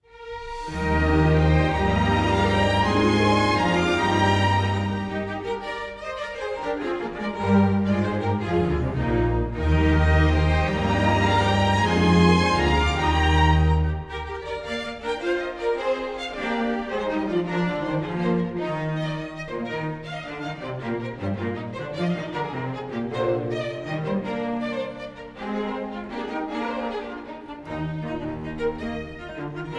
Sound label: Celebration (Strings Variation); Symphonia Original Soundtrack 2024